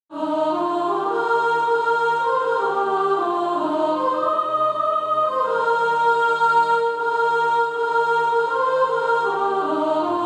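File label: soprano; Down by the Fountain of His Love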